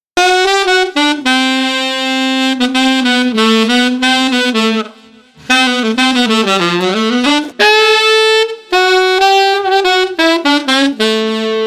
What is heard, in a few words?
Sax Solo 93